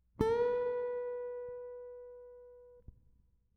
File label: Grace note bend, guitar string bending